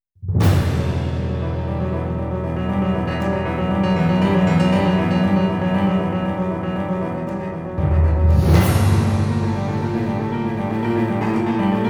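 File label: Suspense, game music; professor layton vs gyakuten saiban; magical mystery music; Hit or Miss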